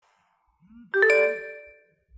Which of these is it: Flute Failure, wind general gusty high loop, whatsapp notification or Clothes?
whatsapp notification